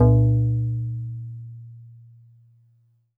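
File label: Steel Drums DX100 A#0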